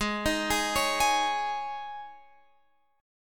Ab Suspended 4th, Guitar Open F; arpeggiated; Absus4; x,15,15,15,16,15

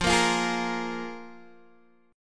F Minor Double Flat 5th, Guitar DADGAD

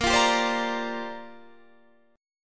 Bb 6th Add 9th Bb Guitar DADGAD

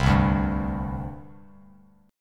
C Suspended 2nd, C, down, Bass Standard; Csus2 strummed; JGuitar

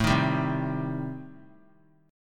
A-Minor 7th Flat 5th, chord; strummed; JGuitar

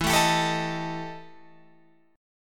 E Minor Major 7th Double Flat 5th E Guitar Open F; EmM7bb5; strummed; 16,16,19,16,18,16

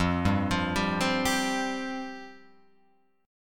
F Suspended 2nd F Guitar Open F; arpeggiated; JGuitar

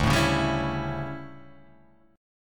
D Major 7th Suspended 2nd Suspended 4th, chord

